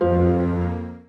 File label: Musician Rebloom Bass